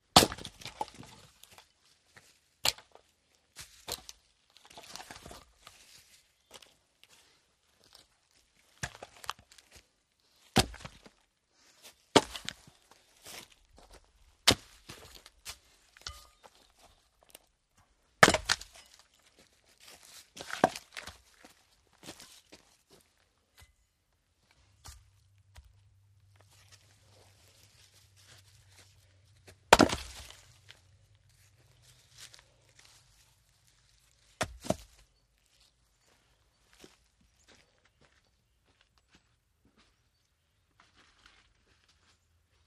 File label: Wood Splitt Logs Axe, logging exterior